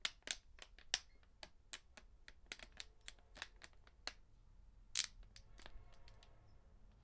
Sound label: Water Bottle Crinkling; Working With My Grandma 4.29.2016